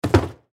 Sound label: Trash Can Close, Trash Can Close Plastic Lid; Household; Garbage